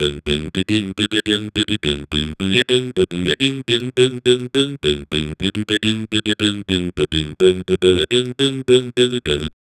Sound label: a simple catchy beatbox